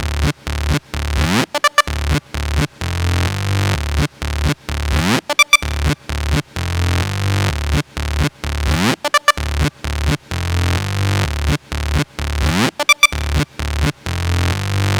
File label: Electro House Melody; Mutekki Media; Vengeance Electroshock Vol.2; Electro House Kit 3